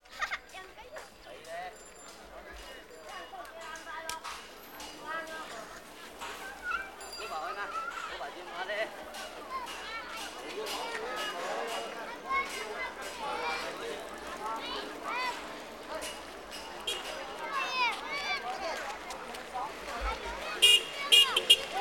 zvuki mashin, probok i avtomobilnogo trafika

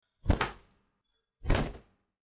zvuki vafelnogo polotentsa

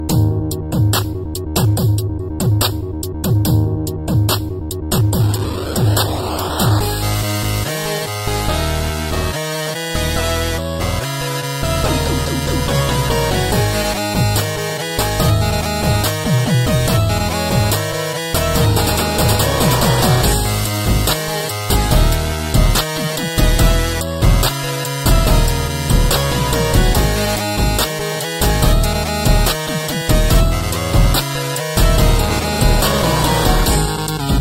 8-bit muzyka na sotovyi telefon ringtony

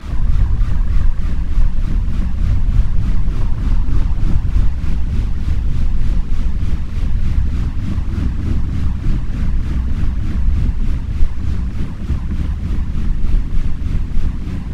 zvuki vetrogeneratora

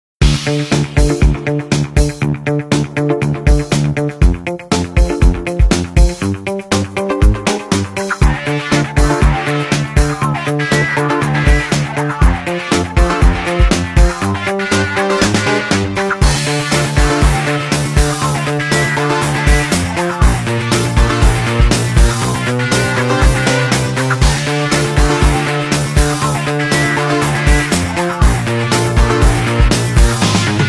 originalnye ringtony nokia 5310 xpressmusic

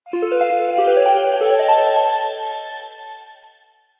zvuki magii

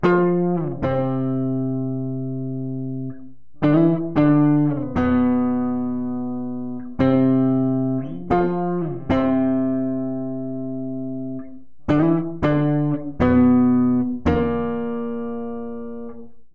zatsiklennye melodii dlia gitary loop

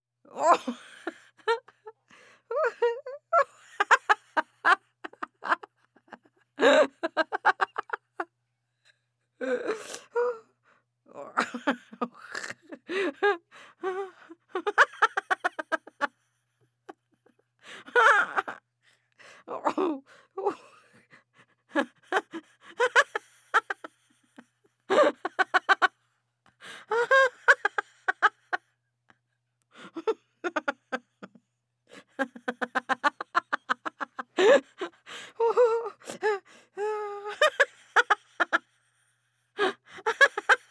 zvuki zhenskogo smekha

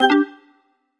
zvuki dlia viktoriny

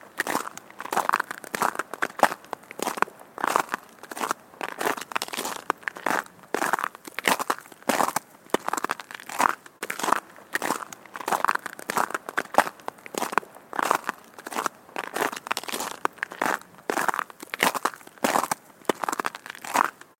zvuki shagov po ldu

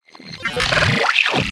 elektronnye zvuki peredachi dannykh

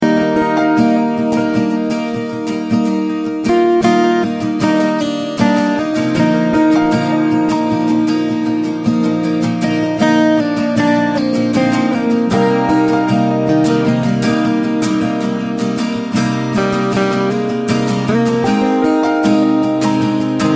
zatsiklennye melodii dlia gitary loop

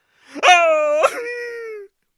zvuki muzhskogo placha

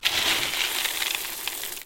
zvuki kofeinykh zeren

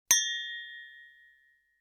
zvuki chokania na prazdnike

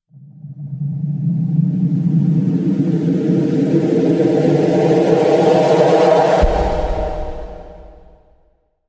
zvuki s effektom peremeshcheniia videokamery

